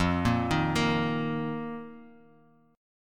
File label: F 7th Suspended 4th F down; arpeggiated; JGuitar